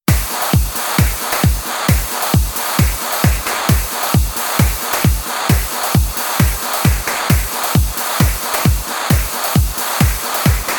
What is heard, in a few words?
Acid Funk Dub